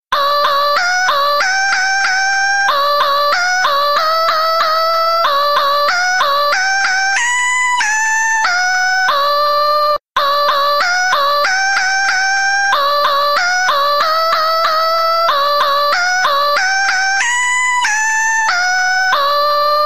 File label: Ring